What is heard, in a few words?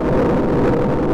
flamer; shootingstar